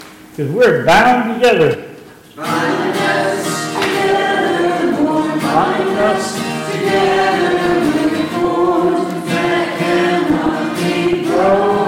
Closing Chorus and benediction; There were 16 here for the service today; Bethel UMC

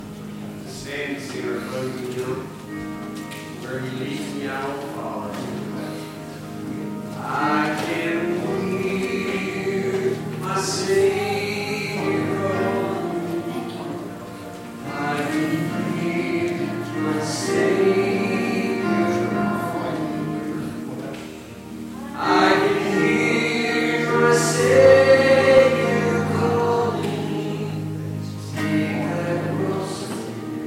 Closing Hymn; Where He Leads Me; Covid-Time

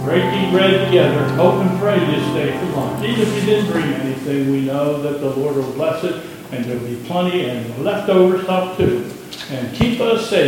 Invitation Benediction Closing Chorus; Invitation, Benediction and Closing Chorus; church service; Bethel UMC

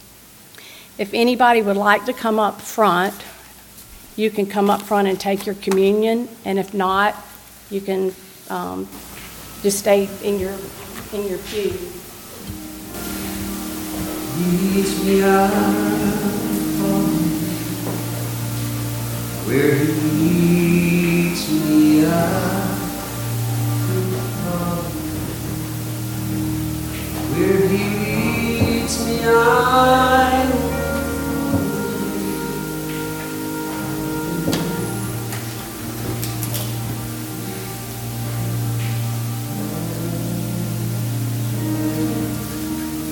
Communion Receiving; Covid-Time

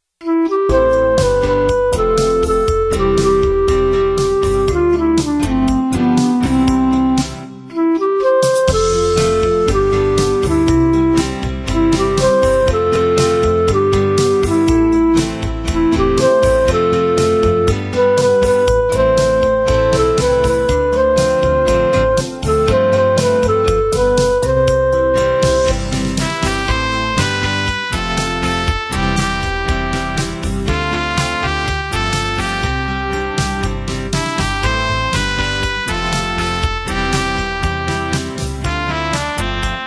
Celebrate Love, Hymns

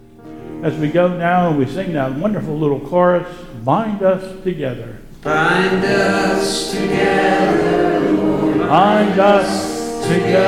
Closing Chorus; Sunday Service

